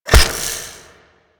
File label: Rain Of Arrows Impact; Warframe; Duviri; Duviri Dax; Abilities; Dax Archer